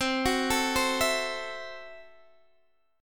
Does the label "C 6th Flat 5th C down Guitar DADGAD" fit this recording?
Yes